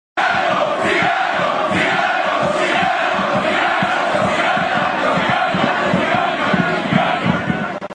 Cigányok; militiagreens; szidas

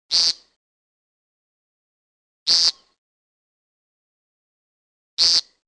szalonka; vadhivosip

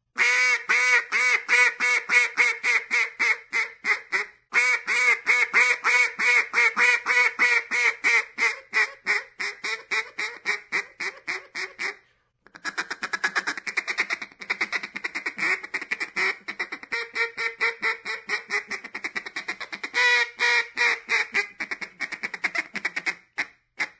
kacsa; vadhivosip

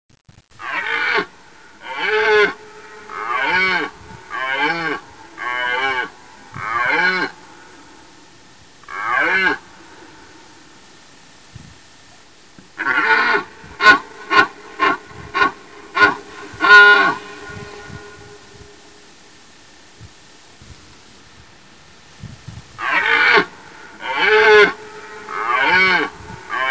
szarvas; vadhivosip

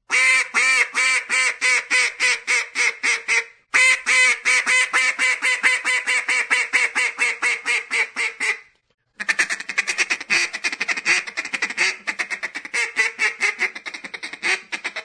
kacsa; vadhivosip

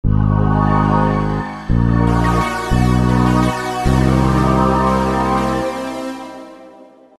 sima drama

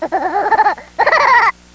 koala; allat hangok

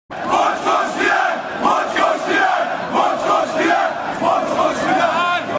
Mocskos lilák; militiagreens; szidas

